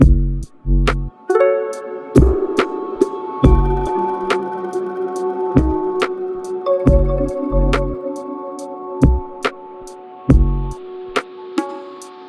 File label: Melancholic Sitar Solitude; yuttari